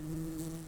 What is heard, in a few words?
Lapidarius workers, bumblebees